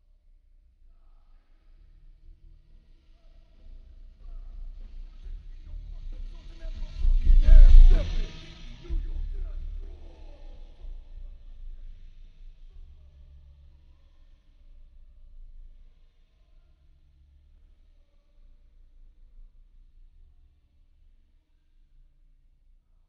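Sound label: exterior music car by 30 mph; Sonniss GDC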